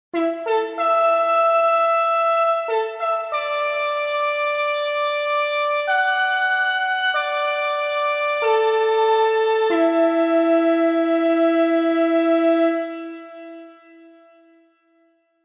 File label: moog brass